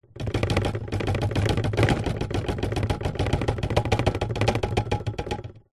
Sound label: household drinking glasses in cardboard box shake; zapsplat